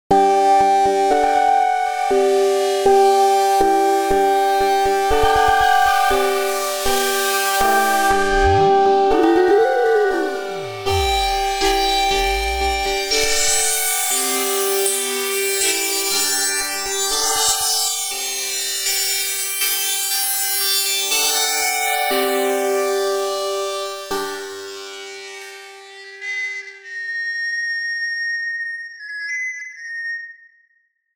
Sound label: Spark guitar